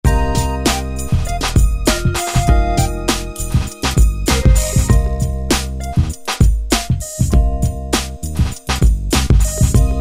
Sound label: Hip Hop, Yamaha QY70; Preset Styles